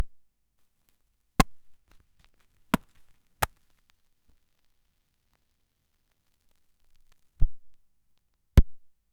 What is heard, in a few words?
pop sound on labels